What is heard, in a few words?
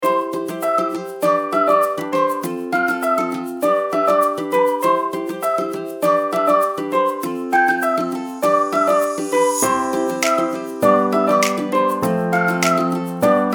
Joyful Spring